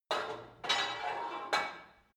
put weight onto barbell quick, fitnessstudio

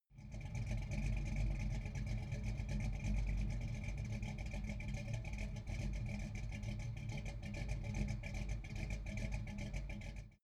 car camaro idle exhaust

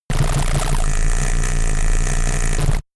space charged laser blaster unsteady; krieg-waffen; soundpool

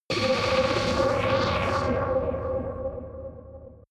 stinger buzz, drones

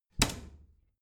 bathroom cabinet door close heavy

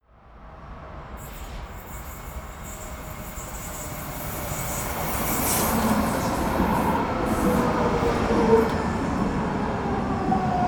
train subway old sequence; bahnverkehr u bahn

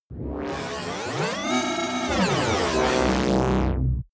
fluctuating synth; comedy-cartoon; elektronisch

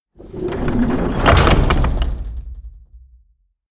door metal close

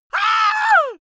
scream male; vocals; comedy-cartoon; soundpool

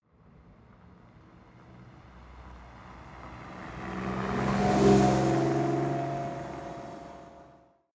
car drive by medium speed

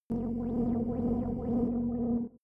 space hover shuttle; raumschiff

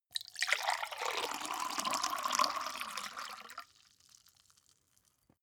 glass pour hard; berufe; gastronomie; soundpool

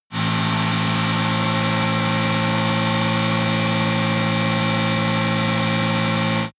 motorcycle engine high constant rpm ascending; motorraeder; verkehr transport

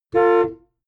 car horn short interior hummer h3

